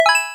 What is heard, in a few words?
success